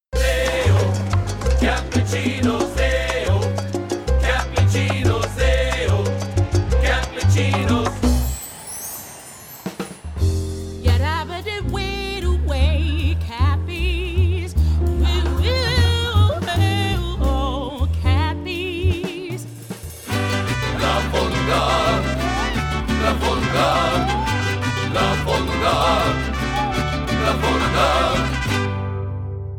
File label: Jingle; Cappys